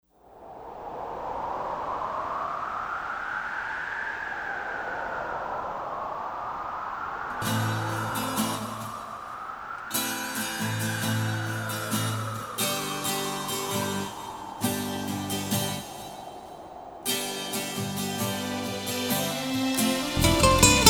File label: Instrumental